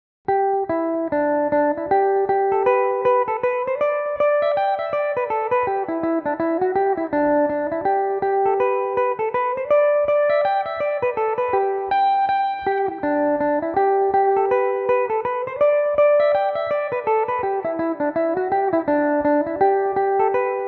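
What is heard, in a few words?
G major, irish mandolin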